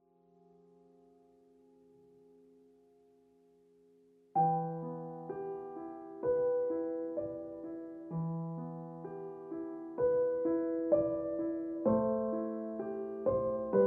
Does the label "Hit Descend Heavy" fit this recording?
No